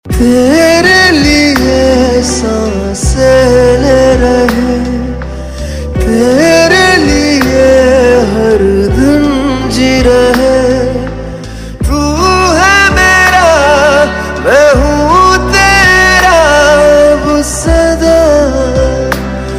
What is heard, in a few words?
ringtone; Bollywood